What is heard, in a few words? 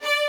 violin